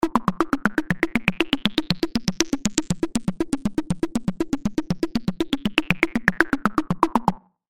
Watery Pitter Patter; Stepattern

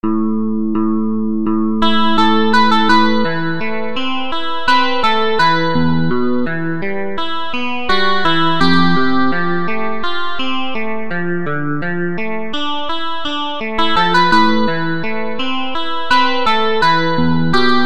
Electric Clean Guitar; GuitarTempus; Syntheway

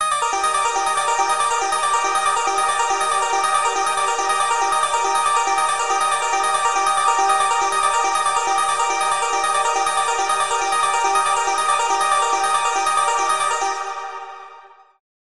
Comet Booster; Astra Turbo Quasar

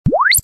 filter sweep

